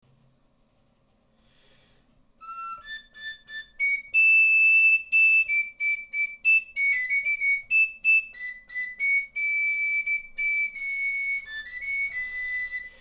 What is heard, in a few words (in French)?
rossignol sauvage 440, Galoubet; Galoubet La